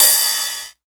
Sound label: RIDE; 909